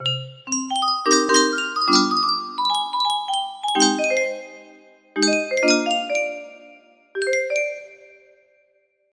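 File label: jazz, music box melody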